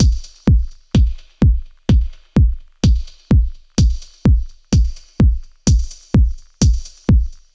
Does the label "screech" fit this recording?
No